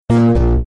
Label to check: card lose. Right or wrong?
Right